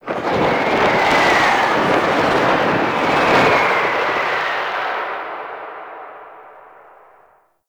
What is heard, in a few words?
Distant Cannon Shells; Adis Heal; Makvoss; Warframe; Tau; BoroOverlook